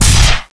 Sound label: spikes up